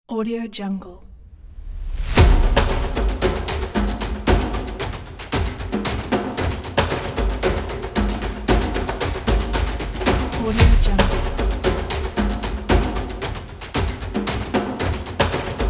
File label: tribal africa drums; musicdownup